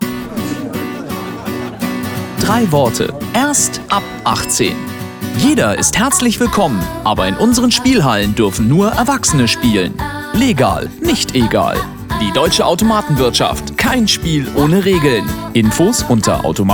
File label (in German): Guitarintro